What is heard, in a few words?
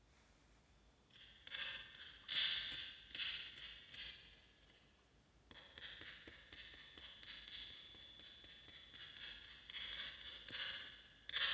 Reverb